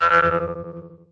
vintage cartoon boing; Cartoon Sound Effects; UNUSUAL KITS and FX

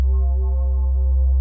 space tone; Pads

